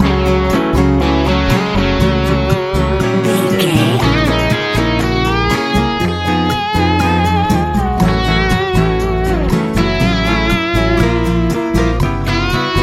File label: easy rock pop